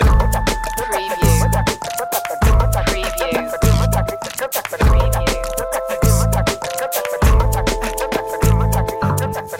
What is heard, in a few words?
bollywood beat loop